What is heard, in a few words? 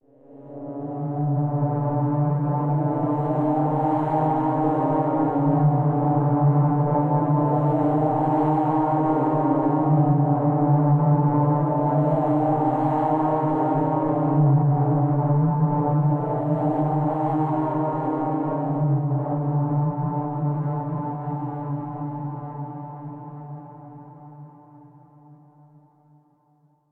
Soundscape, Jungle; Far Cry